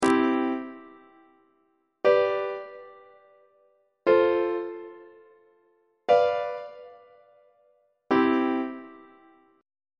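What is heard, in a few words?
c major leading tone imperfect authentic cadence on treble clef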